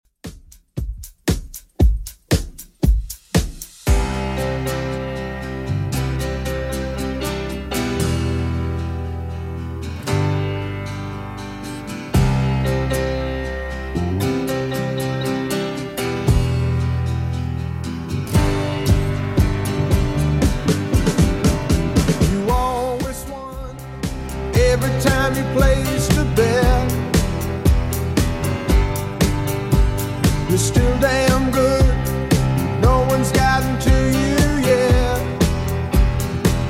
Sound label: 70s Redrum 116bpm